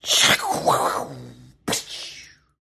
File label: woosh